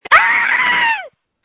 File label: screams